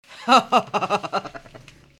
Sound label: Laugh